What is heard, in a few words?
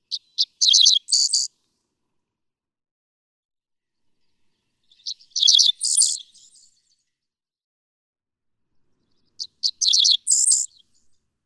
Dickcissel, one song from each of three individuals, Ste. Genevieve, Missouri